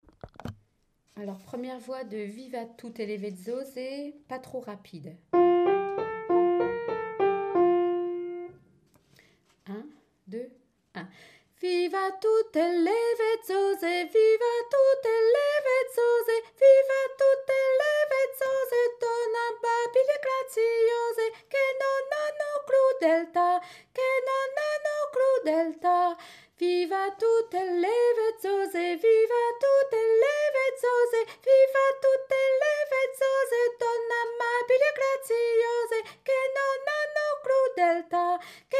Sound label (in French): 1ière voix; Viva tutte le vezzose